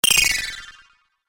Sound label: Tap Fast Fail, tapping; object sound effects